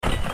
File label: Metal step Base